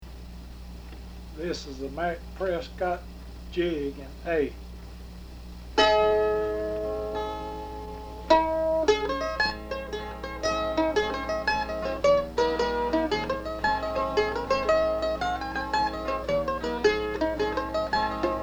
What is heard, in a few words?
Jig in A; Prescott